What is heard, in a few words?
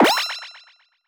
Common Join; Pac-Man Championship Edition 2 Plus